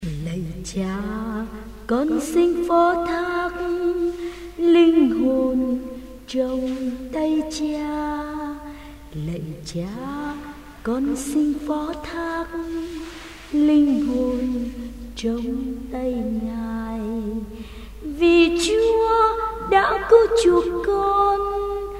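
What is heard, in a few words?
soprano tenor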